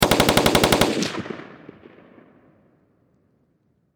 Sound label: Warfare Machine Gun M16 Long Burst Distant Perspective; Farther Away; Machine Gun Sound Effects